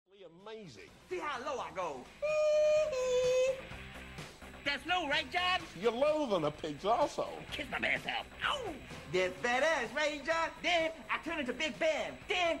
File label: heeehee ringtone